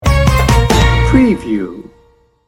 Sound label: bollywood sting bumper